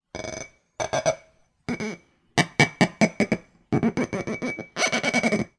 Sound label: foley glass rubs creaks